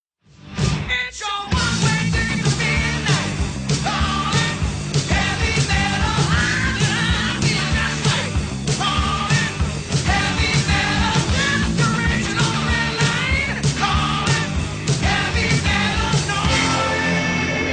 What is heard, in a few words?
heavy metal